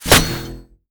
Bsword Metal, Damage

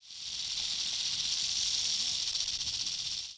Rattle Snake, ambience

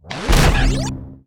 Armed Ghost attack

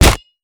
Staff Shell, Damage

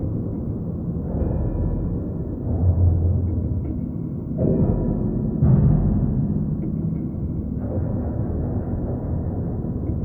Machine; ambience

